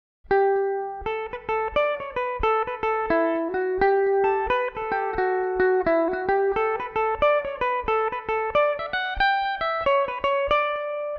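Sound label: D major belmuse, irish mandolin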